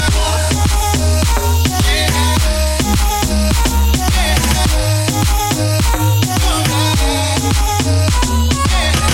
funk; multitrax

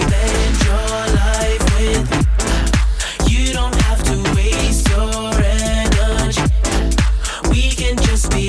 funk; multitrax

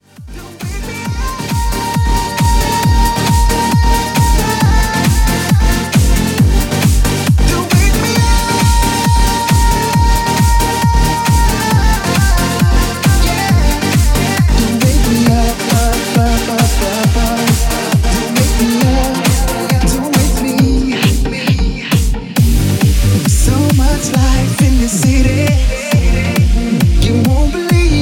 funk; powermusic

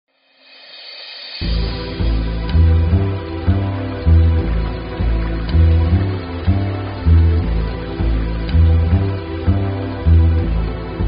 kick; multitrax

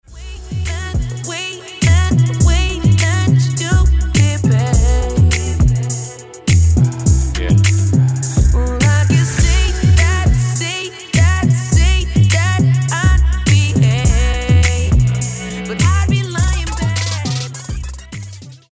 funk; multitrax

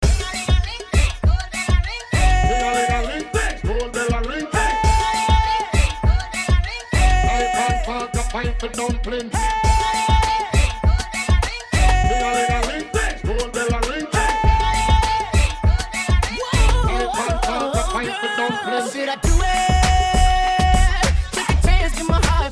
funk; multitrax

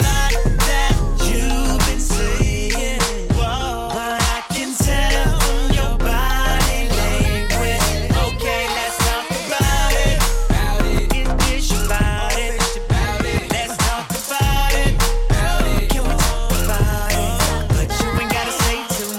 funk; multitrax

